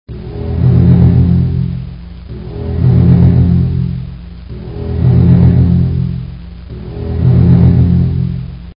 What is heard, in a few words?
Lurker